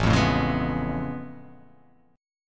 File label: A Augmented Major 9th A down